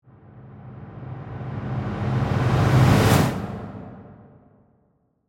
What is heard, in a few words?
Ghost Crescendo, Whoosh, Vanish; Ghost Sound Effects; BlastWave